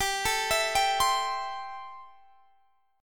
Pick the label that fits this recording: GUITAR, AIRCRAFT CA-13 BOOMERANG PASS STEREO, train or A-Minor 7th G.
A-Minor 7th G